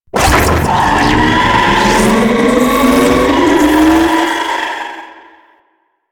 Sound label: Raptor Laser Barrage Charge; Warframe